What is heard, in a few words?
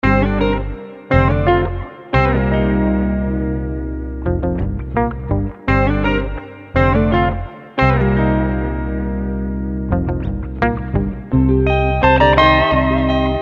Stacked Indie Guitars